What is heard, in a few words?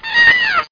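worker die, bugs; Starship Troopers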